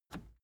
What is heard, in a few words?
plastic button scratch smooth push disable